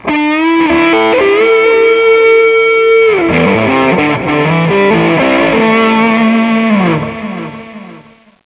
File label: guitar; svencoop